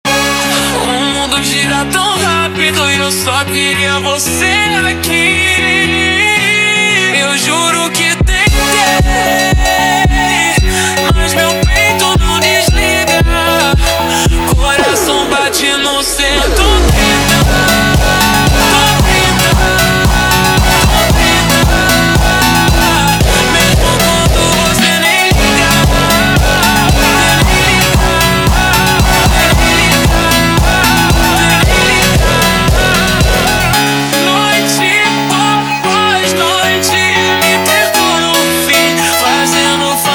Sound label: Montagem slowed